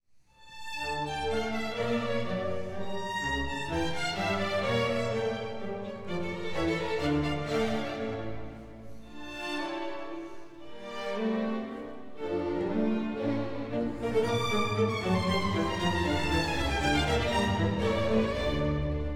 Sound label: Orch intro; K427 Domine